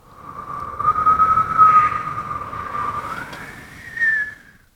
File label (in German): Wind pfeift; Radio AG